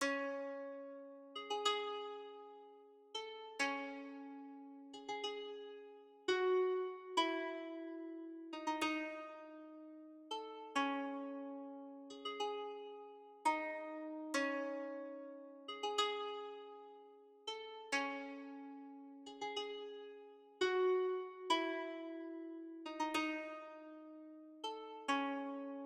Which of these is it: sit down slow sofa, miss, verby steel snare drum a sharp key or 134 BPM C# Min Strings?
134 BPM C# Min Strings